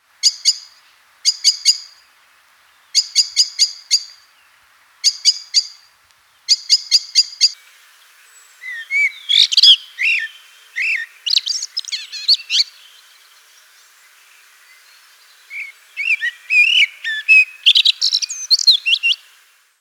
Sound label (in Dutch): Merel